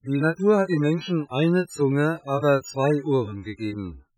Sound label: sinusoids